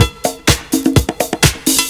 PERCS, 70 s Breakbeats

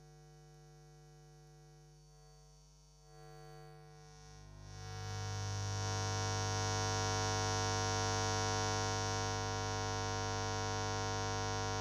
Electromagnetic Field, Electro; electric fields

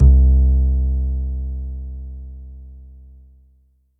AcBass, Yamaha DTXpress; drum machine

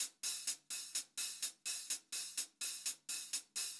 Pop-Rock Drumloops; USB Soundscan vol.37; AKAI

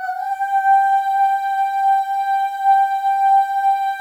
AHS, Symphony of Voices, FEM; Spectrasonics; FEM.2 AHS

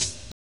Hat2, Casio Rapman-II

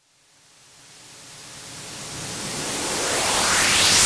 Sweeps Up, Effects; Vengeance; Essential Clubsounds Vol.4

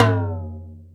More Toms, Drum Machines

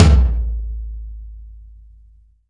tom, Percussion; realdrum

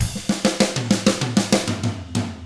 HARD ROCK, Drums; ILIO; Double Platinum Drums 1

